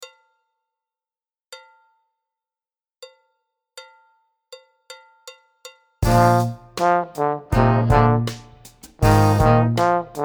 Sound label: Pos Solo